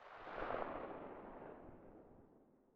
silencer sv98 mono very far

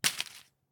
Shell 39mm leaves; STALKER; IWP

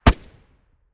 fiveseven silencer mono far, weapons

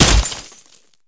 grenade glass; STALKER; IWP

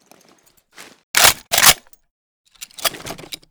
pkm misfire, weapons

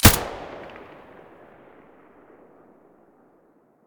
aps muzzle stereo; STALKER; IWP

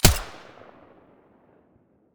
mdr silencer stereo, weapons

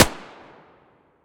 muzzle mp9 mono distant, weapons

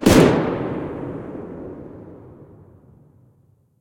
mosin muzzle mono distant, weapons

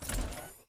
exo step; STALKER; IWP

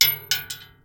Shell 39mm metal; STALKER; IWP

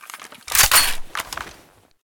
usas12 misfire, weapons

